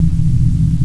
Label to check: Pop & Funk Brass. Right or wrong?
Wrong